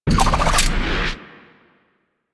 Grineer Crew Ship Pilot Finisher; Warframe; Rail Jack

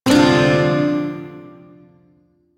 Min Chord D; Shawzin; Warframe; Duviri Shawzin

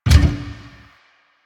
Gear Shift Down, Motorcycle; Motorcycle Ninety Nine

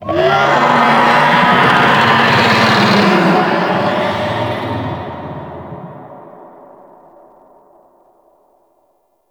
Infested Golem Minion Swarm Vocal; Warframe

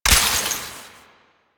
Ammo Pickup Toxin; DE; Warframe; Warframes; Frumentarius; AmmoAbility

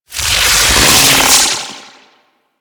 Beam Dissolve; Warframe; Captain Vor

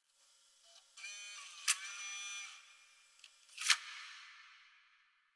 Computer Room Floppy Disc; Warframe; Ambience; Nineteen Ninety Nine

